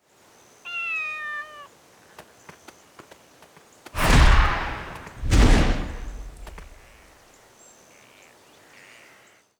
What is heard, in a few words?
cat jump; Ryu ga Gotoku Kenzan; PS3